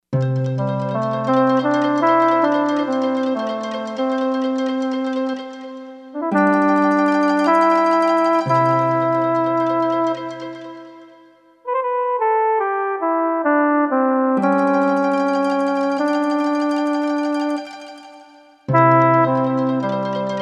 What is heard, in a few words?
Lullaby, Korg MSC-4S Orchestra; Korg MSC-4S Orchestra 1